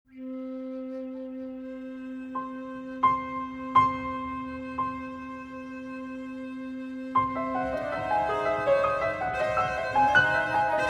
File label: Flute Cello and Piano